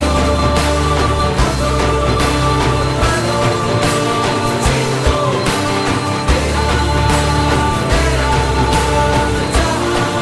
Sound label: India Bass